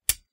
sword; Kenney's Sound Pack

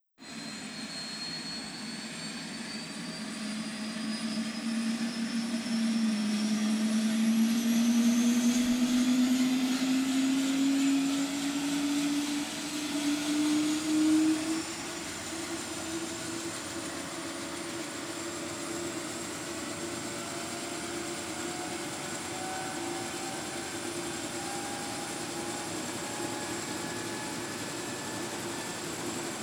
Chopper starting up and taking off; Sounds Visual; The Sounds of New York City

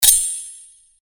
Sword Sound; FXHome; Fighting Sounds

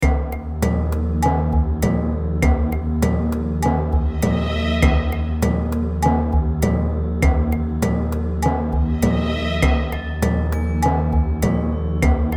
caverns bat, RPG; The Frontier - RPG Set

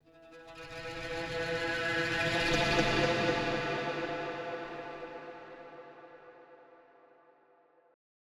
Guitar Movement Tension, Cinematic Guitars; MatiasMacSD; AXmos

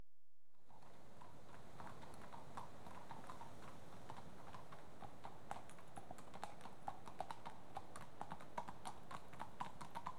Two horses pass at walk on tarmac; BBC Sound Effects Library

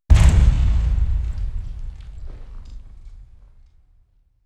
sci-fi metal impact, steel bulkhead hit, low; Alexander Kopeikin; Sci-Fi Metal Elements HD

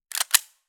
GUN MP5K Cocked Full Action; Spectral Vibrations; Gun Mechanics UK Police

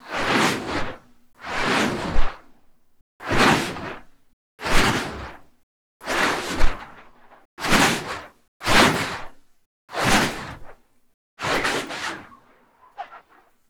Whoosh Cheese Grater; Sartorial Sound; SWOOSH THERE IT IS

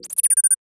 Sensors, Lethal Energies; Digital Rain Lab

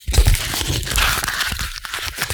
Cardboard Rumble Crumbling; Double Trouble Audio; Punchy Cardboard

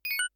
power up; Kenney's Sound Pack; Digital Audio

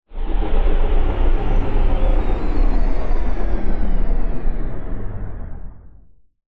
PodRacer Turbine off; Turbines & Engines; Fox Audio Post-Production; Space Racer 2

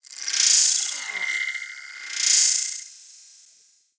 Sci Fi Transition; Digital Rain Lab; Sci-Fi Transitions

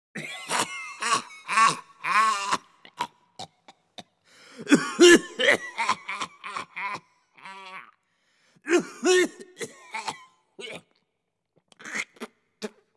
Coughing And Spitting; BBC Sound Effects Library

